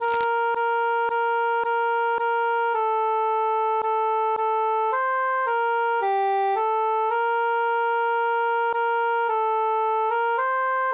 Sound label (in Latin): cantus solo; es ist ein schnee